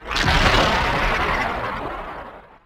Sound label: Nebula Cloud Prepare Start; Evolve; Wraith